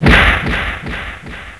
echo slap; ENOMERCY; noyz

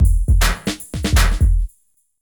DRUMLOOP D+B 160; Drum+Bass

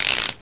ratchet; Tempra Access; vision

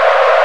SHIP SKID

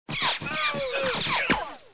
explo

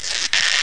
rip; mordor

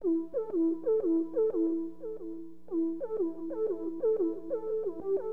whistl; HipHop; LiveAct Pool 1

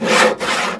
Work Sawing; Ambient; PC Gamer IT CD 46

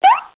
Boink

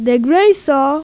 SAW; Chatka

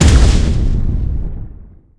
ener wp; StarSiege

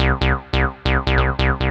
bass; Techno Music Maker

